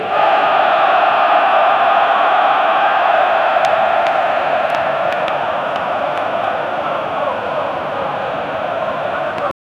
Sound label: a huge stadium full of, chant